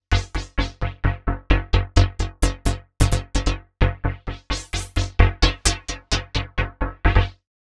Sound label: melo 16 beats